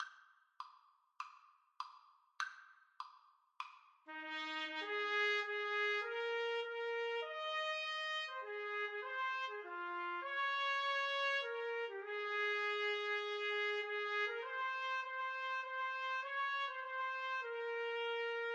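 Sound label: la marseillaise trumpet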